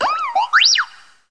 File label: R2D2 surprise; UNUSUAL KITS and FX; R2D2 Construction Kit